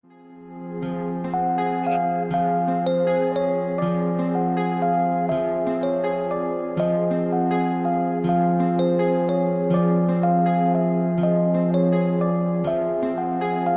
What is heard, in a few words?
celeste